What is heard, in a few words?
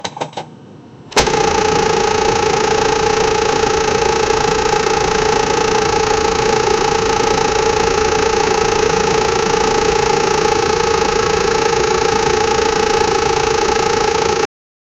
constant sound of 4000 rp, engine sounds